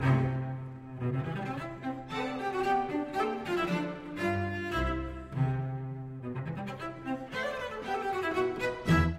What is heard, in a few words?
CELLO